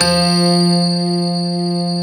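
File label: grand piano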